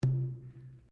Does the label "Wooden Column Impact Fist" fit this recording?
Yes